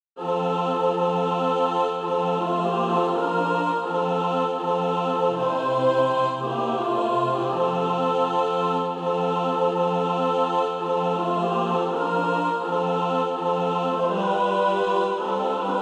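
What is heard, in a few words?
mixed, SATB Practise Music; Christ Is Coming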